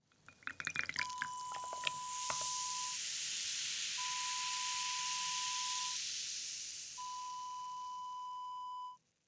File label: soda pour